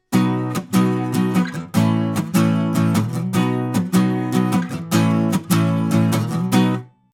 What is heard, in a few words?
Neumann U67 Guitar Strumming Omni 20cm